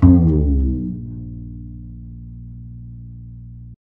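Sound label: glissando, double bass; Univers Sons; Jazzistic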